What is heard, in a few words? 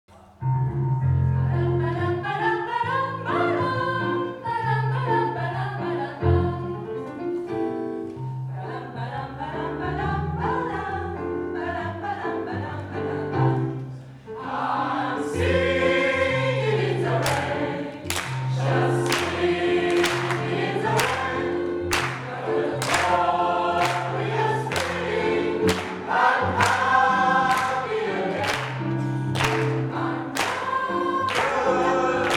Singing